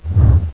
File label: panther claw miss; svencoop; sc strangers